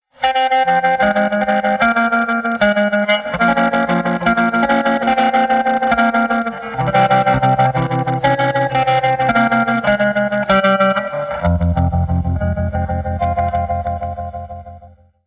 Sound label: bang bang electronic